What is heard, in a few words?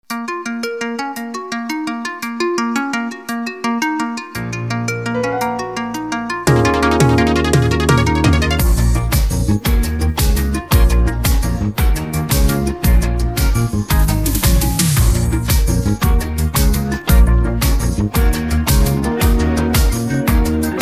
instrumental, persian